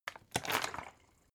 bottle water big upend hard; gastronomie; berufe

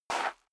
step high heels gravel light slide short; schritte; Footsteps; menschen

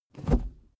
suv pull back gear straight fast hard; verkehr transport; autos

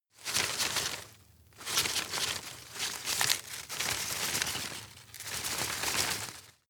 rustle hard; berufe; foerster

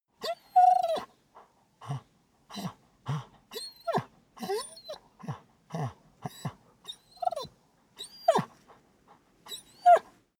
dog squeak pant; natur-tiere; hunde

